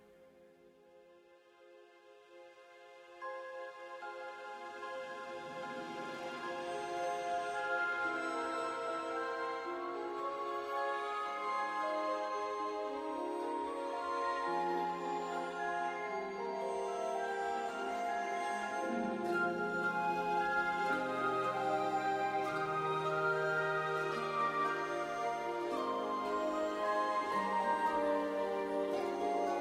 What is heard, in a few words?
theme